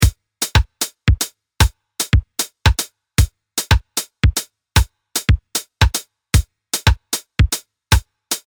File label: hemiola cross